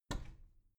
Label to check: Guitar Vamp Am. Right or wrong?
Wrong